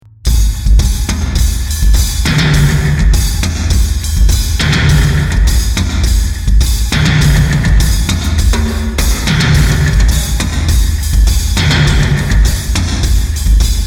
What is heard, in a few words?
groove bubble; Splinter